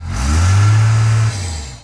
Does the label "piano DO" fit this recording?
No